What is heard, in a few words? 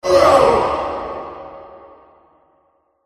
boss wound; eldive